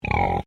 Animal Pig Landrace Grunt; Alice; Edgar's Mission Farm Victoria; Pigs; Animals

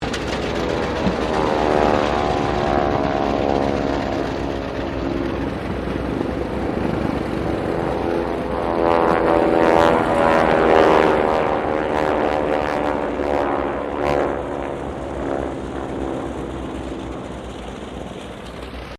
AIRCRAFT UNKNOWN PROPELLAR SQUADRAN PASS

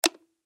Button Switch; Multimedia Web Interactive

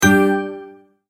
Multimedia Tone-Stop; Tone Sound Effects; Sound Design

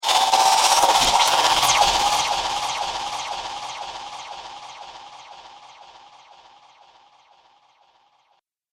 Sci-fi Laser Machinery; Science Fiction Sound Effects

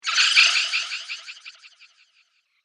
Cartoon Magic Spell

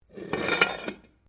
METAL ON STONE SCRAPE; Metal (Sound Effects:Materials)

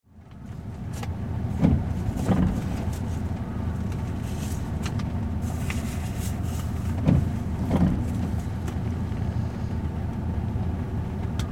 Taxi Interior Rain Idle Accelerate Kyoto Japan; Taxi interior, Kyoto, Japan. Vehicle idling, light rain; Car Sound Effects

